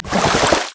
q blast; LoL; syndra

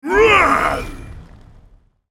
spell cast effort; ZombieBrand

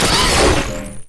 droid move; LoL; viktor skin03

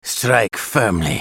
attack; BlindMonk

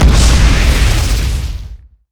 charged shot; LoL; graves

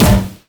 axe throw; olaf pentakill; LoL

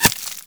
frostshot; LoL; ashe

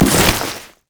frost flamebreath; LoL; shyvana

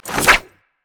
pick a card blue card; LoL; twistedfate

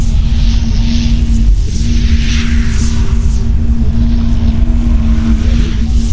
wall of pain loop; LoL; karthus; lich

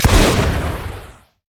charged shot; LoL; graves

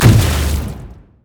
landslide; reef malphite

